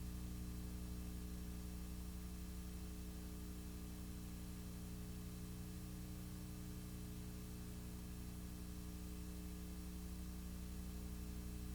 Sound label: VHS Static Snow Noise